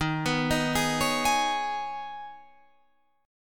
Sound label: D sharp 7th Suspended 4th Sharp 5th, Guitar, Drop D